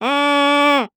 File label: Animal Mammal Carnivore Sea Otter Whine; animals; AdobeCC